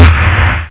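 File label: DRUM BUTTON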